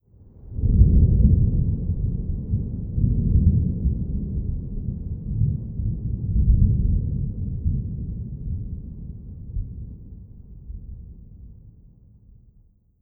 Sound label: thunder